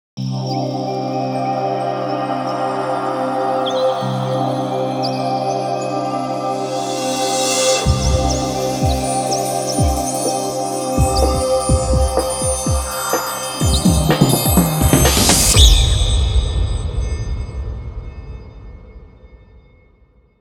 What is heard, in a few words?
instrumental